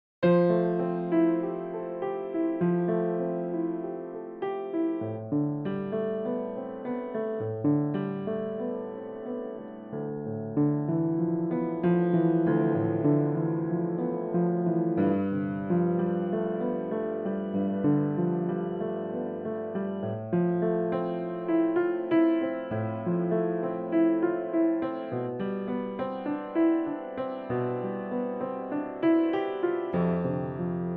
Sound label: piano improvisation